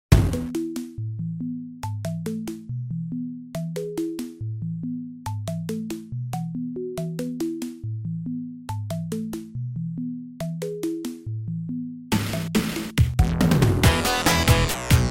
FM funk Sega music

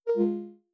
Error

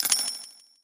coin; Clicker Heroes

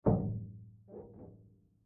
thump squeak distant; Project Zomboid

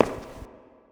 foot tile echo; Project Zomboid

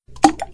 Mouseover Tab; RimWorld Biotech; RimThemes; Hunt For Me

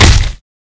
hit; Garrys Mod

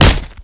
fists miss; Garrys Mod

